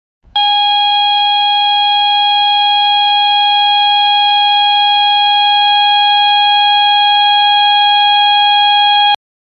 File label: alarm